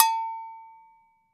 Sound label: AGOGO BELL; AKAI S-Series CD-ROM Sound Library VOL-5